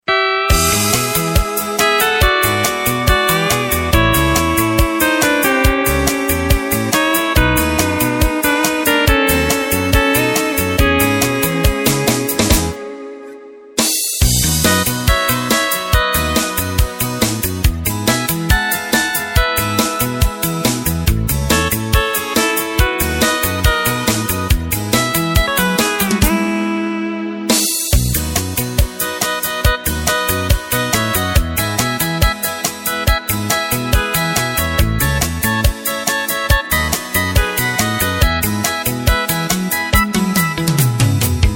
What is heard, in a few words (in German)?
Playback, midifile